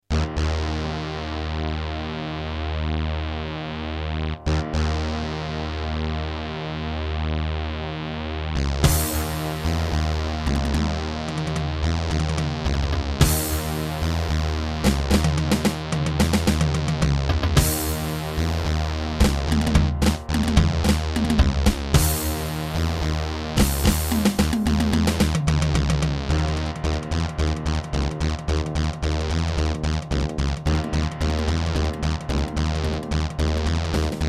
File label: Terratec MiniWaveSystem TTWT